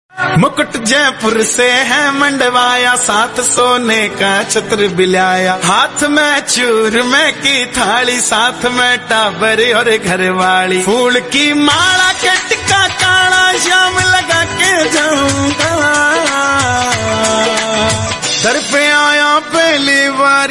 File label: Shyam Bhajan Ringtone